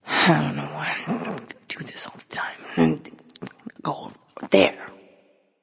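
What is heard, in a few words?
grumble, female; smite; voicepack; hera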